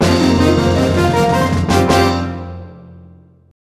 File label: Fanfare - Victory; Pokemon XD Gale of Darkness; GC